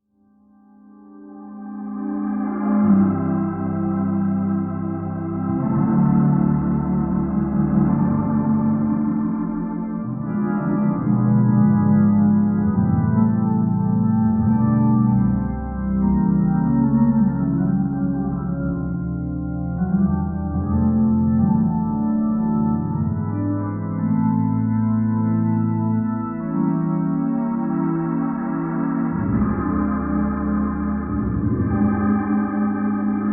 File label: Interlude Instrumental